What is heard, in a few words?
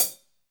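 HH, hi-hat; Sound & Vision - Gigapack I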